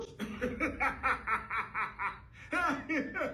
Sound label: laughing; sentinel